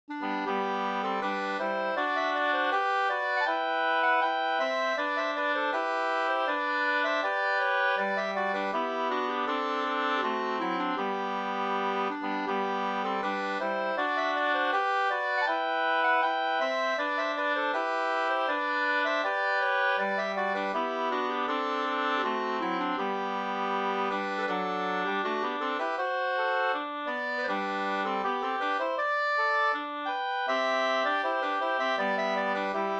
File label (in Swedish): brudmarsch G-dur